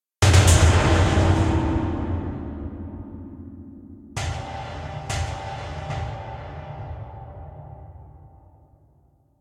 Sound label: Derro piano notes, Clockwork entities; SyrinScape